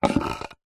household swimming pool skimmer cover stone tile slab lift remove